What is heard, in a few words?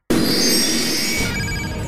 monster summon